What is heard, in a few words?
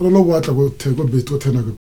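voice utterances, Africa; Roland LCDP11 Africa VOL-1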